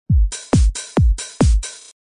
Simple Beats Sound Effect

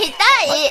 polka

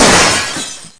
glass break

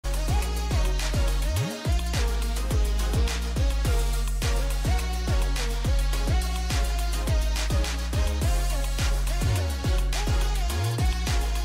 hang loose celebration emote; fortnite

